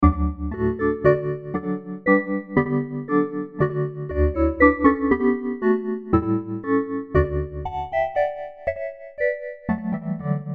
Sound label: marimba vibe